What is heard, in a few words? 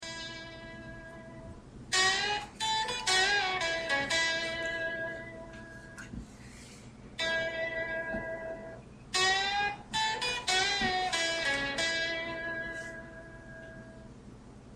blues licks for guitar